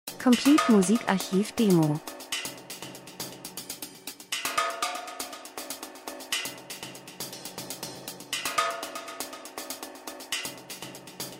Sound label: drums; Spannung, Krimi, Fliessband, Maschine, Industrie, Film, TV, Hihat Bassdrum Becken